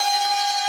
synth main

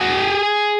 Bend full

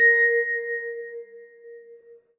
vibraphone A#5

